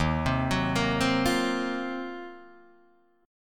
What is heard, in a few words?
D sharp Minor 6th Add 9th, down Guitar DADGAD